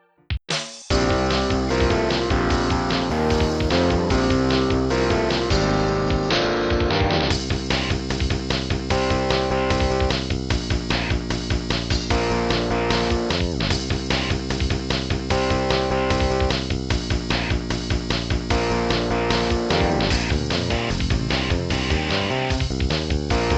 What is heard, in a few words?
Guitar Challenge; the sims bustin out; gba